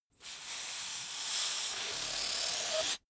balloon air release

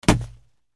football throw

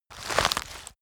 rotten wood crack

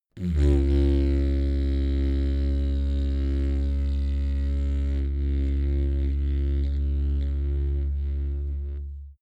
didgeridoo soft tone

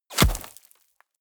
bullet small impact dirt

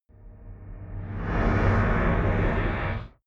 rise sudden thrust

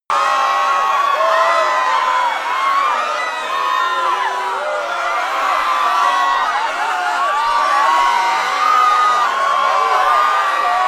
panic

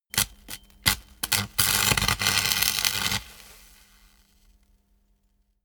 spark

